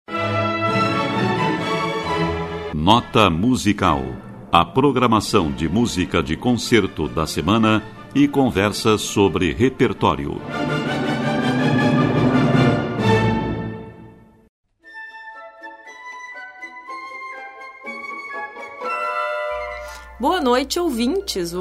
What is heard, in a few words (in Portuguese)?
Concerto para clarinete; Francaix